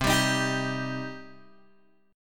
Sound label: C Minor C down Guitar Open G